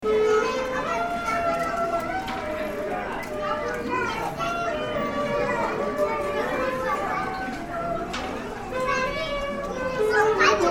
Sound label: backalley swing, nepal